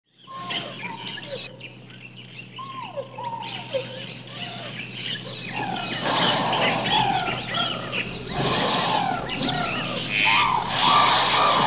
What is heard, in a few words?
Animal Sounds